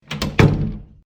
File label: Door Close; backrooms 2d android ios online windows gamerip